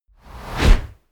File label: push